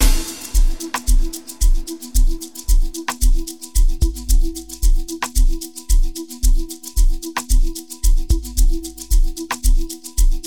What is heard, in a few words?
LOCKDOWN MIX